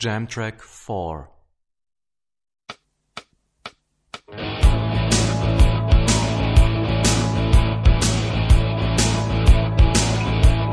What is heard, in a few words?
Rock guitar; Rock gt Secrets